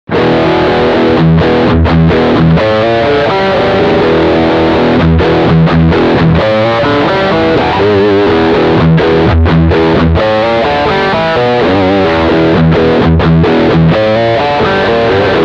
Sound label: Humbucker Rear Riff